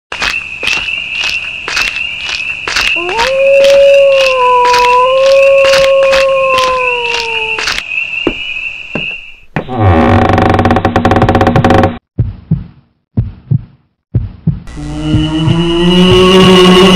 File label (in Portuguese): som assustador